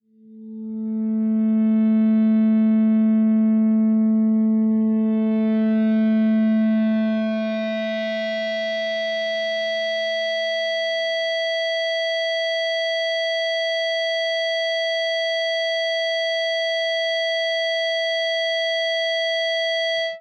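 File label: Distorted Feedback A 5th String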